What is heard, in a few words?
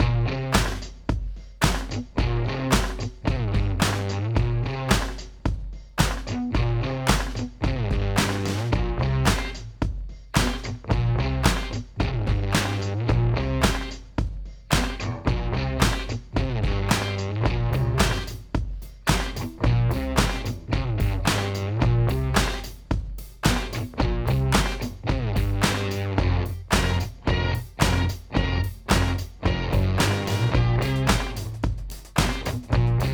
Instrumental